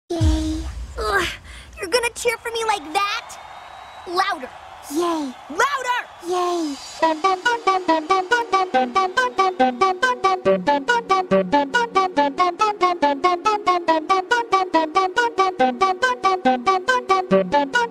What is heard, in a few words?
music; freak fortress 2; rainbowdash